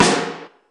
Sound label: snare, Kurzweil K2000